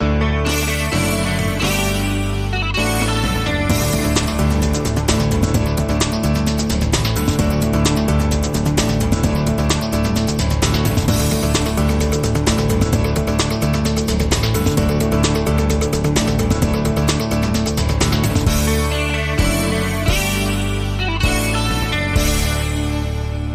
Breaking News Bumper

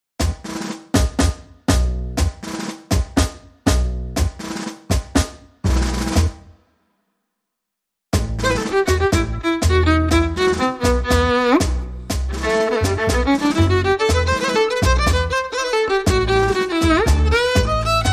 No Guitars No Accordion

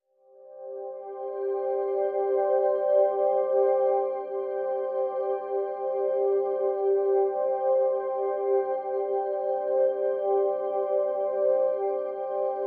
Pads (C)

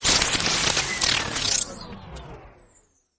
Harsh Noise Sweep

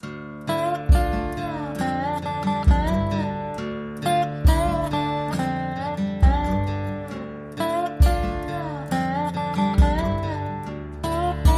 Blue Swamp Blues

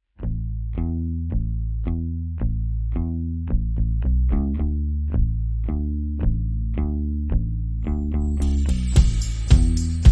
Drums and Bass Only

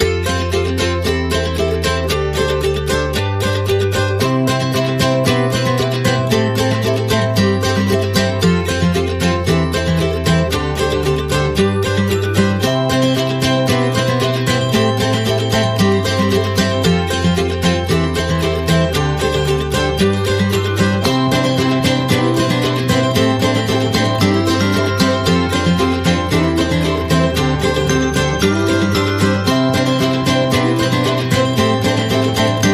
upbeat acoustic Christmas no drums; 60sec

